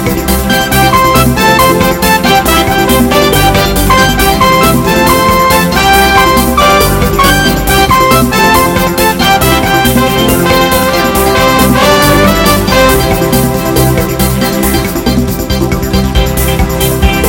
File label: Hot Fast and Happy